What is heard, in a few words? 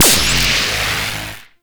Powerplant Tesla Coil Hit; Gameplay; Warframe; Corpus Railjack; Tether Power Plant; Ambience